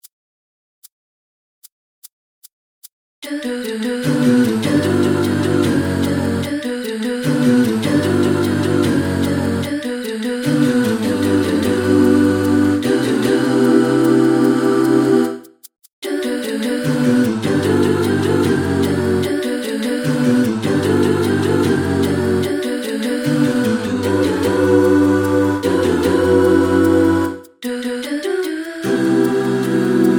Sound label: Tutti